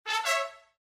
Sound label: Hardware Insert; Windows